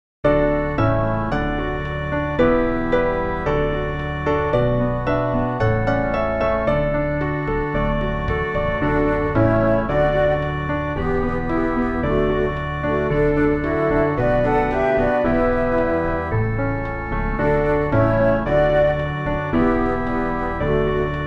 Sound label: instrumental; New Hope Music